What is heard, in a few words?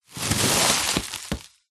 foley plastic bag thin empty blow up inflate